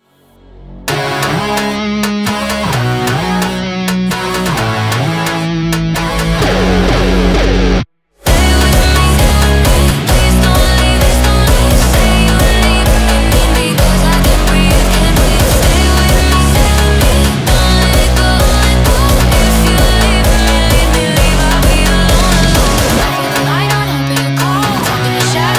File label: Jumpstyle Rock Version